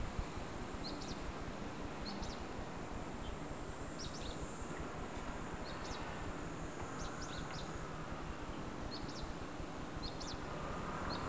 bird, soundscape

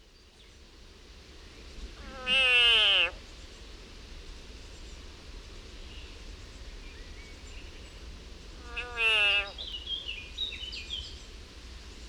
agony of a frog; soundscape; Yannick Dauby

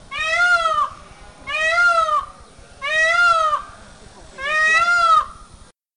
big bird

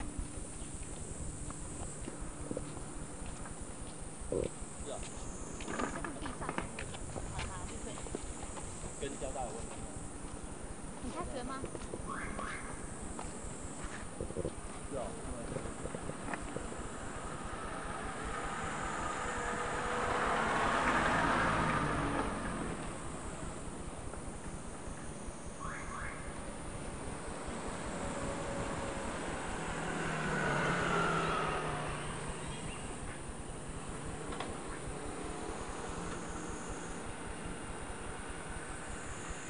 car pass; soundscape

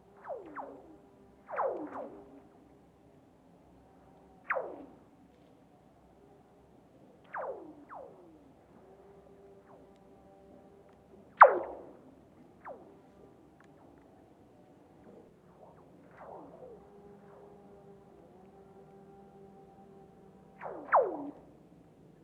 lake; soundscape; marc Namblard

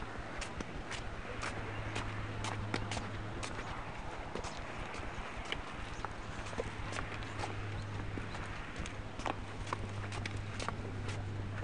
foot step; soundscape

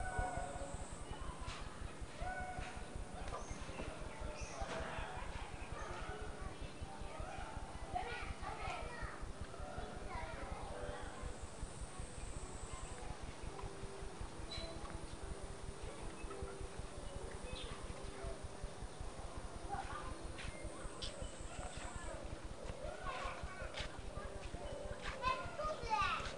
children

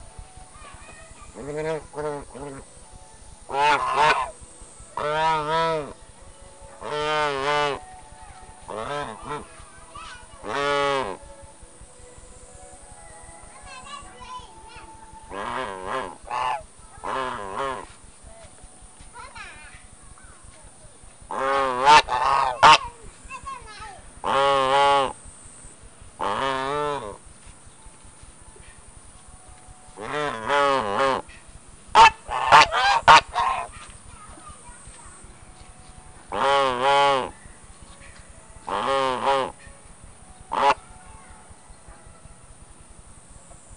duck

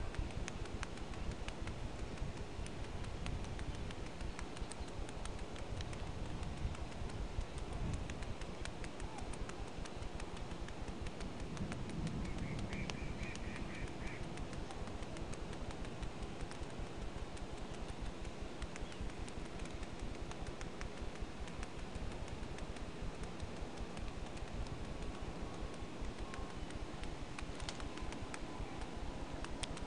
water drop; soundscape

